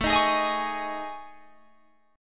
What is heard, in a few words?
A Major 7th Flat 5th, down Guitar Drop D